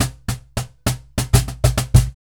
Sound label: Cajon Fill; musicradar